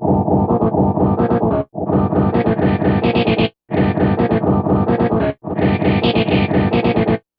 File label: Synth Distorted; Electrocution